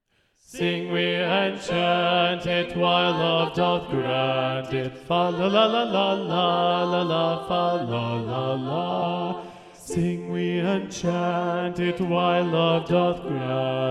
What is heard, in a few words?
SATB Bass Predominant; Sing We and Chant It; Thomas Morley arr Russell Robinson